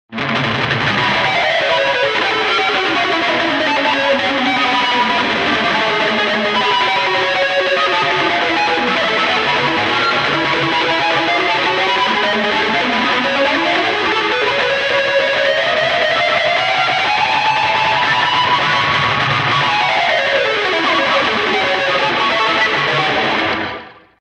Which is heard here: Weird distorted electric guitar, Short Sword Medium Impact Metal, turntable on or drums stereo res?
Weird distorted electric guitar